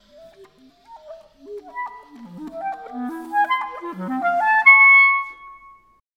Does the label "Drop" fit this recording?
No